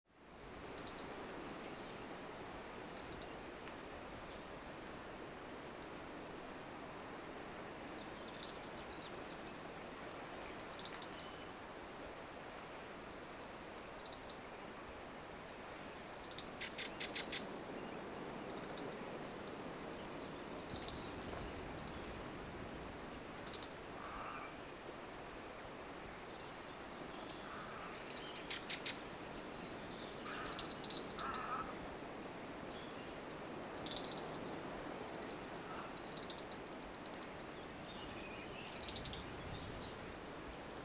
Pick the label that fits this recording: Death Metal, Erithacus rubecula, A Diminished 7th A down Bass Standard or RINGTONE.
Erithacus rubecula